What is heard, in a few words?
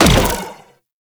Swarm Missile Fire; DE; Warframe; Weapons; Sentinel; Helstrum